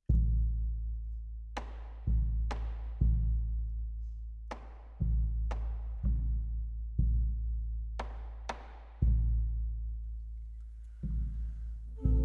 Kyrie For Solo Violin, Vocal Quartet, Percussion and String Orchestra